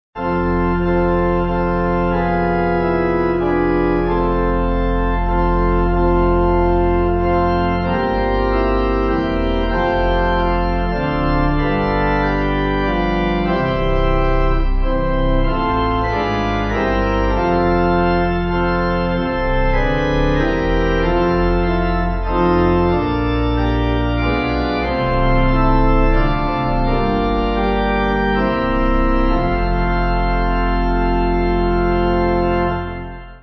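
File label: Pipe, hymn backing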